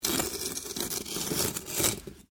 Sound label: Stone On Stone Small Scrape